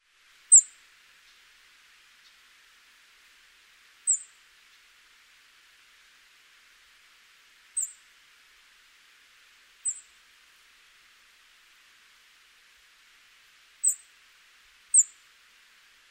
red throated parrotfinch

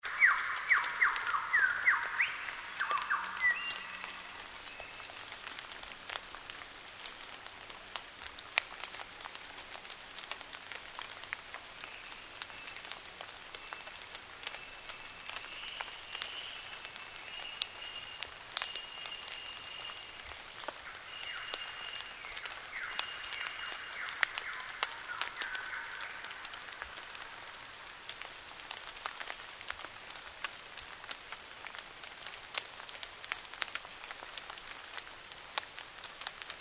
superb lyrebird

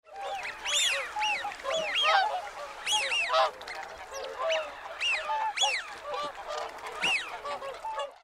wigeon

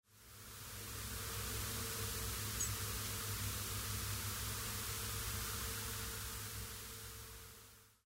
robin nocmig

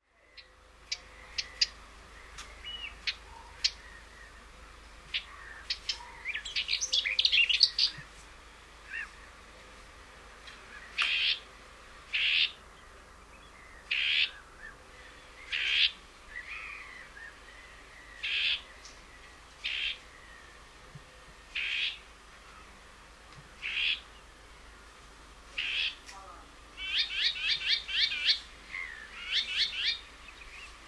whitethroat

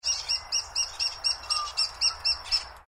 lesser spotted woodpecker

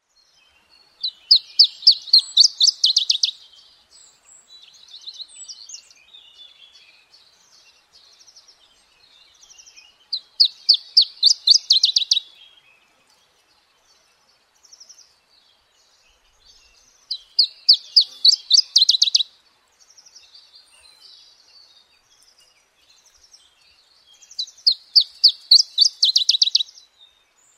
iberian chiffchaff

